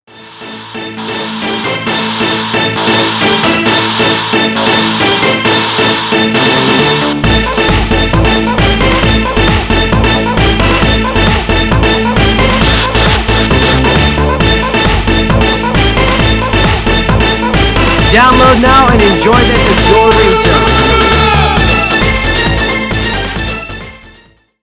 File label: Theme Music Ringtone; Mortal Kombat